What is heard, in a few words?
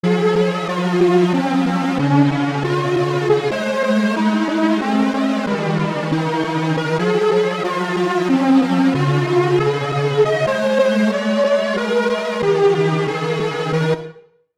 Oscillator LEAD Wavering Spectrum; Alphatron; Syntheway